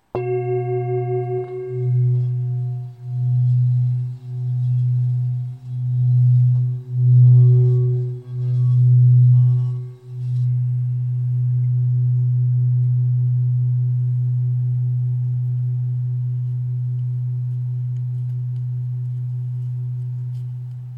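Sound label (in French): Bol bengale fa diese, bols chantants tibétains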